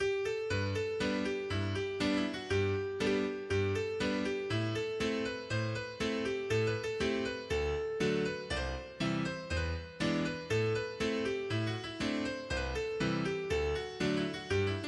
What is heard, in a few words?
Bantry Bay Hornpipe